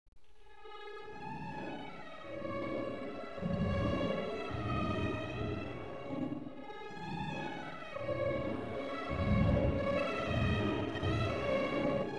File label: classica